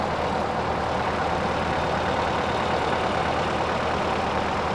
drive, vehicle; car old

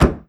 old close door, vehicle

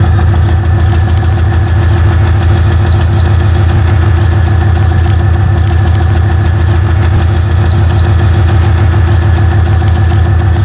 engine, vehicle; te7

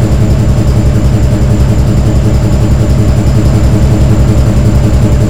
engine, vehicle; elomnibus

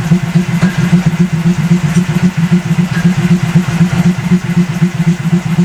idle, vehicle; liaz677

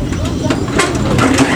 close door, vehicle; liaz677

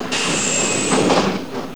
door, vehicle

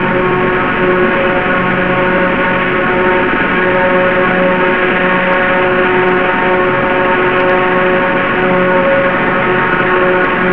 drive, vehicle

